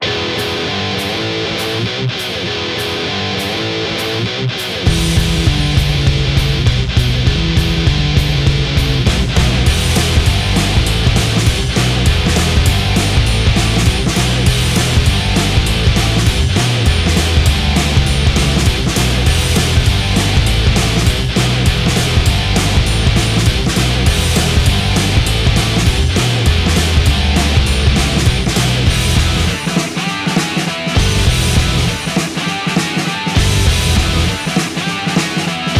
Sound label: loop; svencoop; uboa rampage